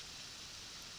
Background Noise

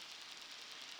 Boat Noise

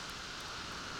Background Noise

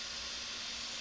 Background Noise

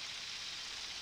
Background Noise

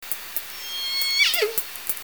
call, SeaSounds

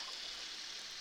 Background Noise Clips

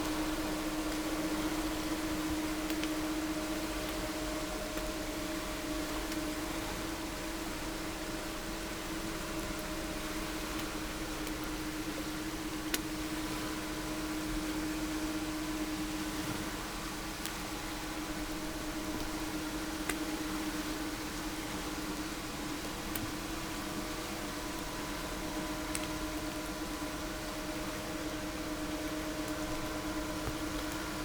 No Ship, helicopter

